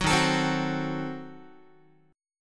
Eb Diminished 7th Eb down Guitar; Ebdim7 strummed; 4A,4E,5A,5D,5G#,6C#; JGuitar

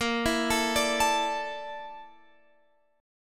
A sharp Major 7th Flat 5th, Guitar Open F; arpeggiated; chordshape

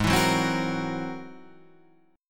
G sharp Augmented 9th G sharp

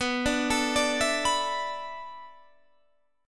B Minor 11th B down Guitar Standard; Bm11 arpeggiated; JGuitar

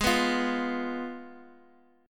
G sharp Minor 6th; strummed; x,x,x,1,0,1; Standard

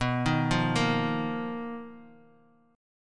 B Major 7th Flat 5th B Bass Standard; BM7b5; arpeggiated; JGuitar

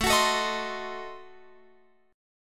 A Diminished 7th, Guitar Open F; x,16,18,16,18,19; strummed

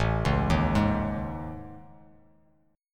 A Major 7th Flat 5th, down, Bass Standard; AM7b5 arpeggiated; JGuitar

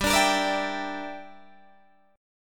G Minor 6th, Guitar Open D

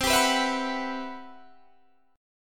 C Major Sharp 11th, Guitar Standard; CM#11 strummed

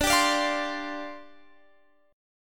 C Suspended 2nd Suspended 4th, D

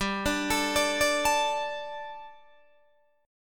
G Suspended 2nd G, down, Guitar, DADGAD

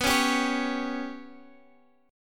B Suspended 2nd Sharp 5th, Bsus2#5 chord; strummed; JGuitar

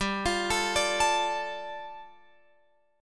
G 7th Suspended 2nd G, Guitar Open F; G7sus2 arpeggiated

